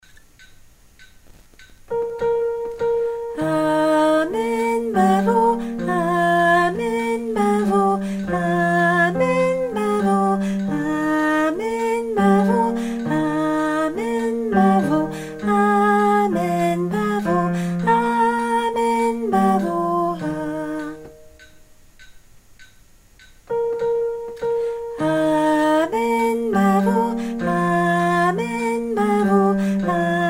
basse

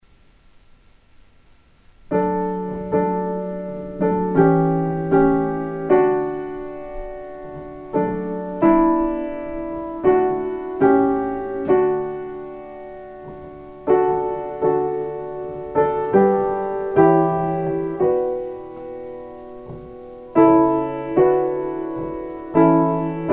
voici la paix sur nous piano

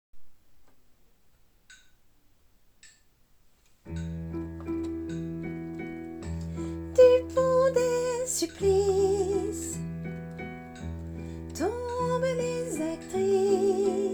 basse; valses de vienne

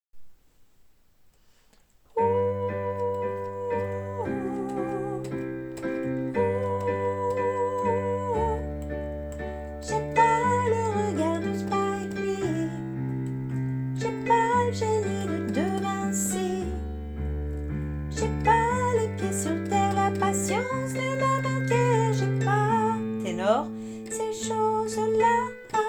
hommes

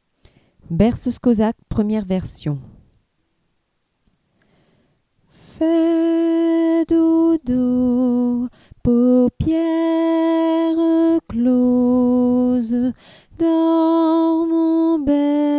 berceuse cosaque version hommes

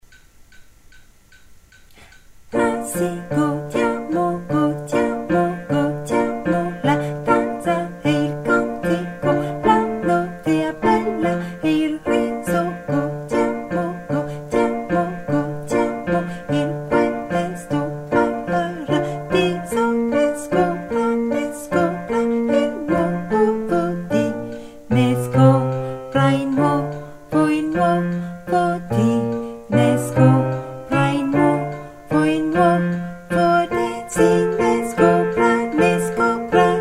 hommes

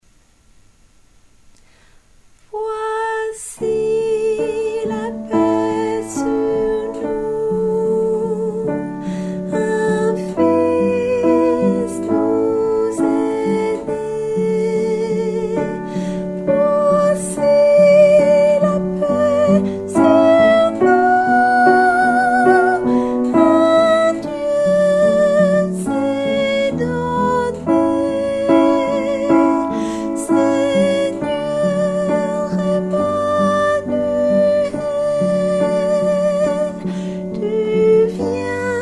voici la paix sur nous tenor

